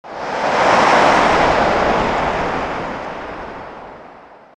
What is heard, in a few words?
wind gust; Garry's Mod; tf2